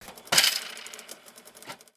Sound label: pay Money; Singles Flirt Up Your Life